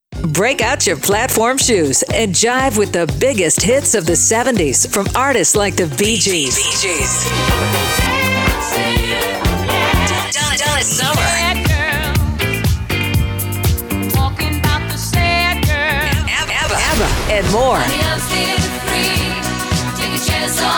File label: 70s Classic Hits